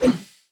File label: left whoosh